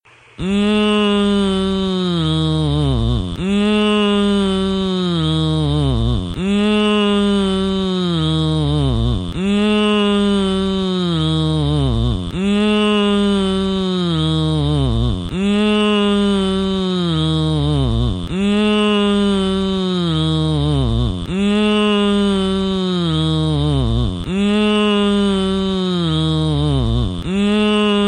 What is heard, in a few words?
Humming; WFAN